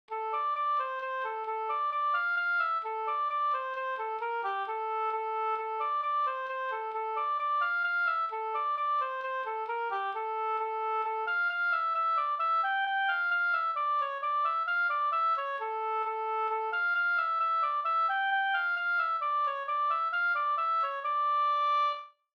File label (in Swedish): polska Slangdans